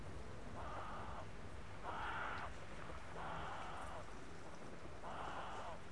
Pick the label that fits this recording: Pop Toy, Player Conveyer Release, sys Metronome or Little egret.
Little egret